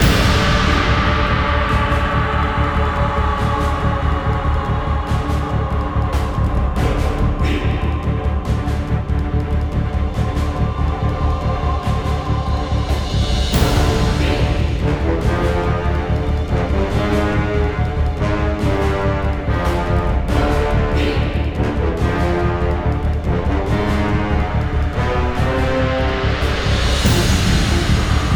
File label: Creepy Action; Lego Batman 2